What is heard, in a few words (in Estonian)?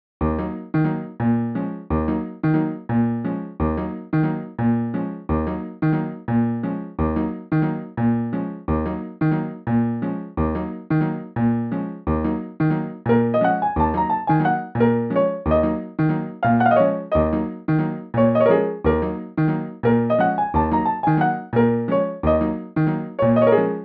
ilma trummideta; sonicpi